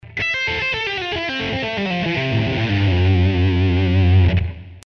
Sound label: scale patterns, guitar